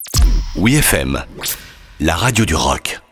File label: jingle; ouifm